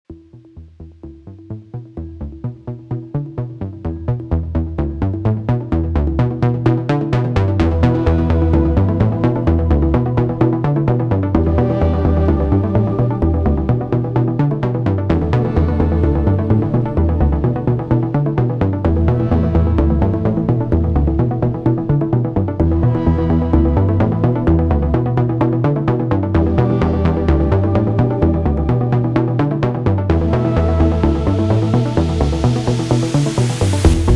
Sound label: bg music; The Augmented Designer Program